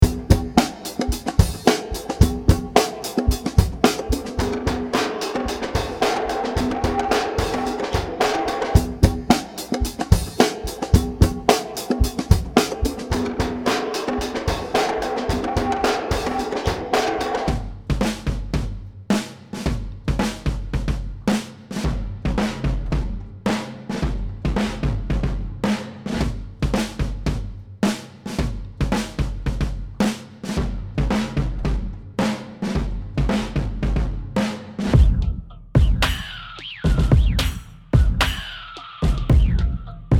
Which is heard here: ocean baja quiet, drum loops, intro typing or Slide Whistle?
drum loops